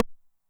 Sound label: RIM SHOT, 909mod; classic machines